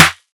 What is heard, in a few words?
short acoustic snare D# key; Duration 0:01; snare drum samples